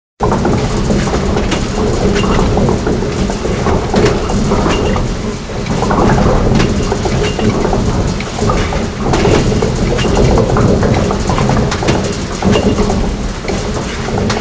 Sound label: Machinery Ambient; Eternal Darkness Requiem